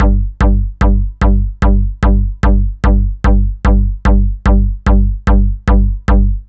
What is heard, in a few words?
Solid Bass; Dr. Fink's Funk Factory